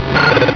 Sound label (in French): Cri